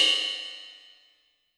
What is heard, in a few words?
Ride Cymbal Light, YAMAHA RM1X